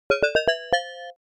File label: win tune; gmod; pcasino; basic slot machine